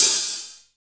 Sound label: HH, Drum Dry; East Collexion; Drum 1 Dry